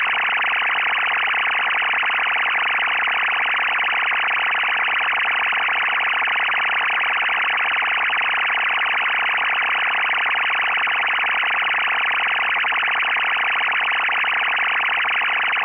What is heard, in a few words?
RUS MFSK-8 200Bd